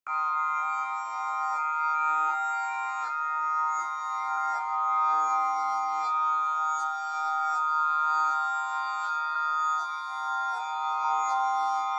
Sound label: ambient